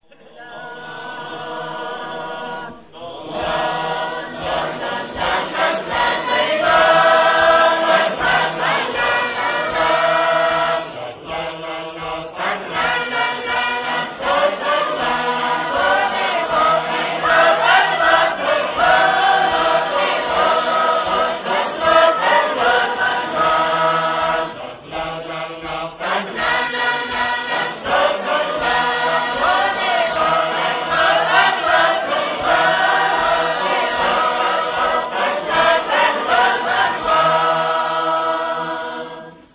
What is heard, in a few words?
harp